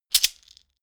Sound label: shaker wood hit double hard